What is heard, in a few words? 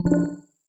chatroom notification; steam